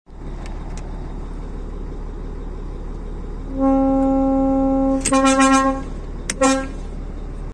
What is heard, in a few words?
Volvo 500 horn; ولوو ۵۰۰